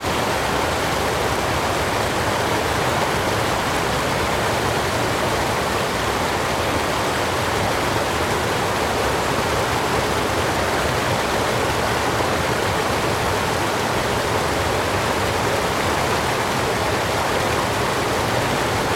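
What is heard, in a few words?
water weir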